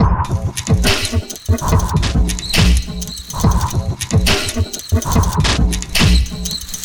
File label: Vinyl Loop; Sonic Foundry (Sony Creative Software); Esoterik Beatz; Vinyl101